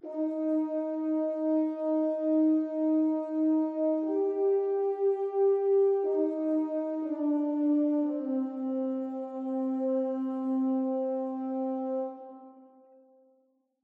Horn; Military Exploration

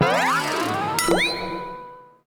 Cook Mushrooms Mushroom Flick; The Princess and the Frog; wii windows; Hub Swamp

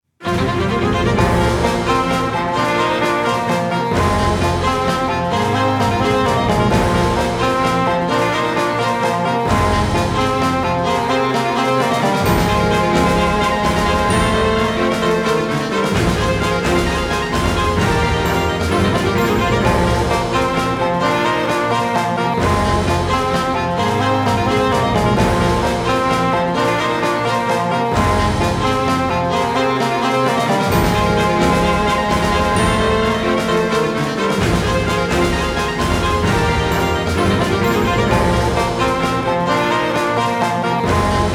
National Anthem; Advance Wars 1+2 Re-Boot Camp; Orange Star

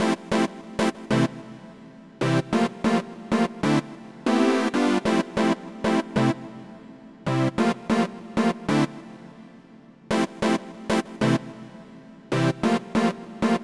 Polystacks

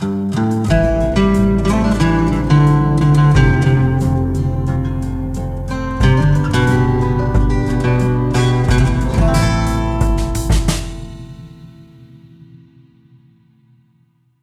Stinger Picked Guitar Industrial; steamworld heist ii

